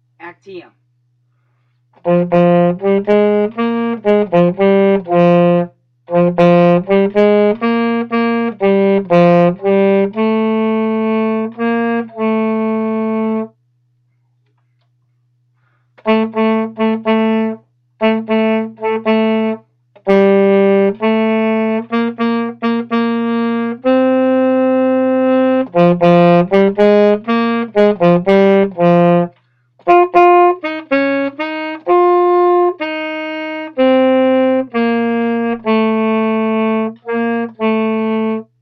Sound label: Saxophone